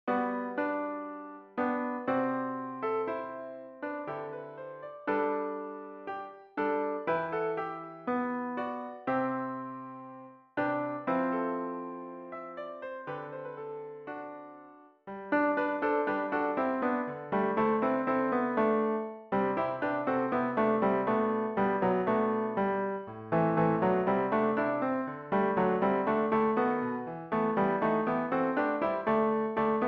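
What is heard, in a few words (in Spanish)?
Tenores, MIDI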